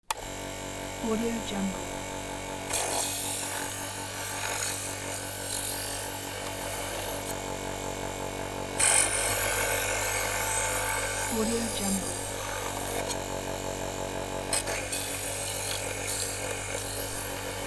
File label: electric knife sharpener